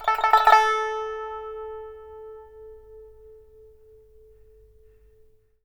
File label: SAN XUAN, A5; Spectrasonics; Heart of Asia